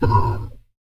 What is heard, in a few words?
hurt, aliens; shevaar